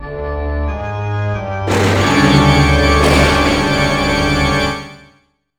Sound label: BGM Horror